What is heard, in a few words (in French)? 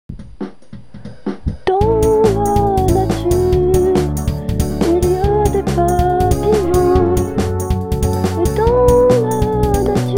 les abeilles